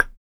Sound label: PERC CLICK, MALE PERC; Spectrasonics Vocal Planet; Jazz FX